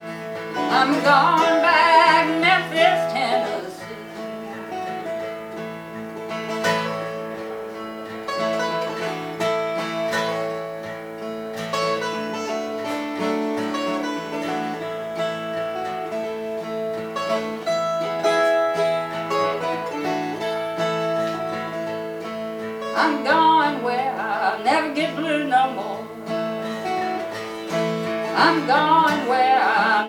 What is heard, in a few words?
musicbox